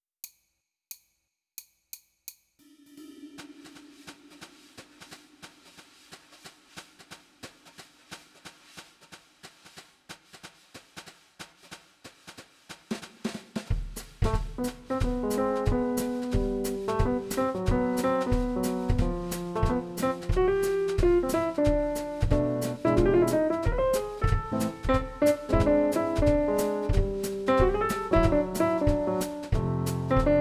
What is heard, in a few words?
minus bass